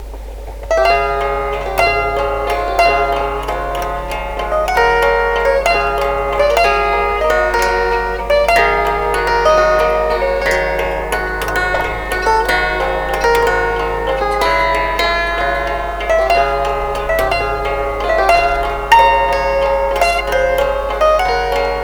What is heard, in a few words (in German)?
Chordephon